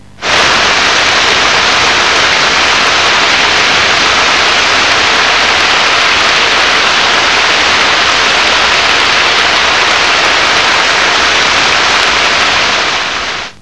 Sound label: Rain, 雨声; 自然; 特酷声音素材库